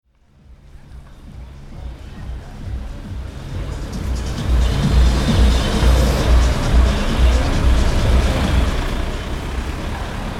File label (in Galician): coche rua